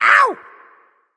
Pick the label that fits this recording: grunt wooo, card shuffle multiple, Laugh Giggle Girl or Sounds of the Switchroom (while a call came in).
grunt wooo